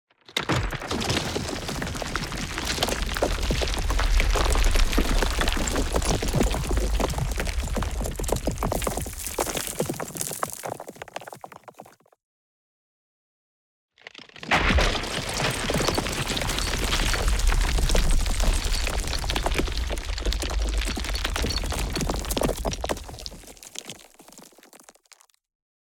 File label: Massive Wall Collapsing; Stones and Debris; Justsoundeffects